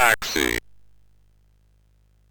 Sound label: sid MOS6581R2; Cave of the Word Wizard Set 3